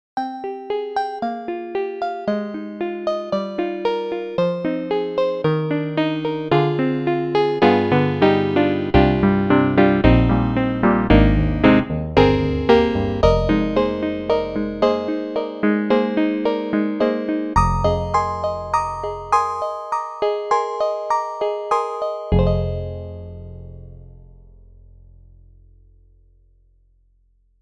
FM Stiff Grand; Steinberg HALion 7

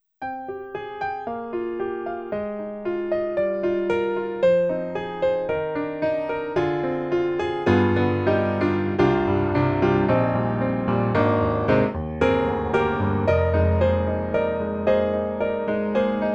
Keys; Native Instruments

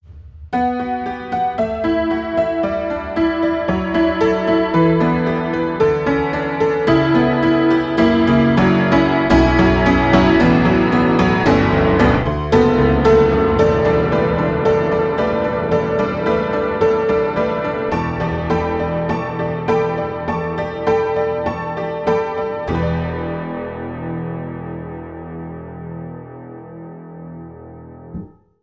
Pianoteq D4 Super Tonk; MODARTT S.A.S.

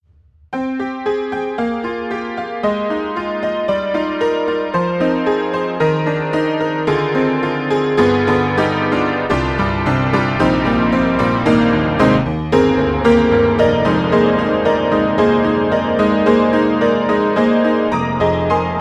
Pianoteq D4 Honky Tonk, piano; MODARTT S.A.S.

